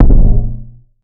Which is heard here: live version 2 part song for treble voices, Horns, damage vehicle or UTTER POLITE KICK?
UTTER POLITE KICK